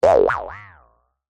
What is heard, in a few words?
cartoon boing squirrely jaw harp; zapsplat